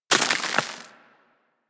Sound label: Bullet Impact Debris Snow